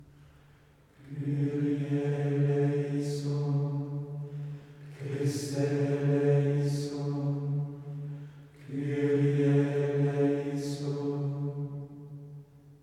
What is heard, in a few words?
Chant Completorium